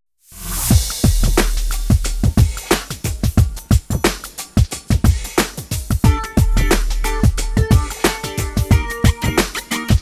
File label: Funky MIX